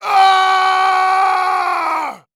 Horror Scary Human Male Caveman Scream

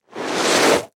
Foley Hand Push Dirt

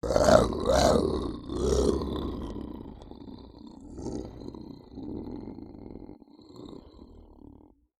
Animal Mammal Carnivore Cat Lynx Snarl; animals; AdobeCC

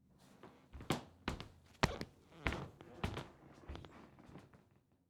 Foley Footstep Barefoot Squeaky Kitchen Floor; Foley Footsteps

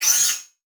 Multimedia Internet CD-Rom Flash Element

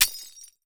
Crash Glass Break Bowl With Sledgehammer; crashes; AdobeCC

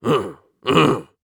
Human Male Grunt

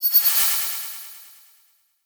Title Transition Delay Short

